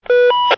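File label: push-to-talk, radio effect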